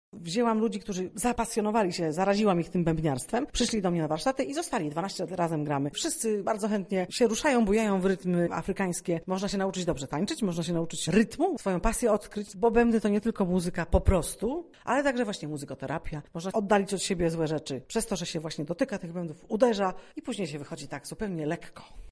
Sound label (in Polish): Młode Djembe